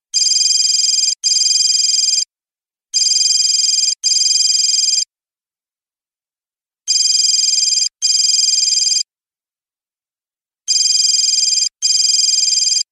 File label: Long Ringtone Ringer